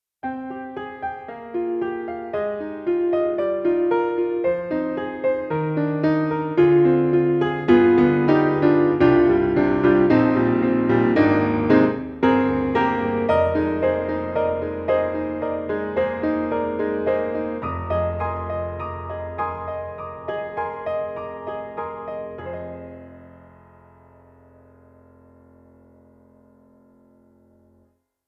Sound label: Orchestral Grands Steinway B Mid Mic; Orchestral Tools; The Orchestral Grands